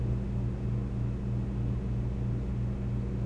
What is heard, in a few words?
room; firefight